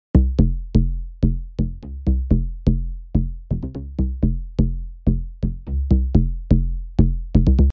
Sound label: Bass Deep House; Midi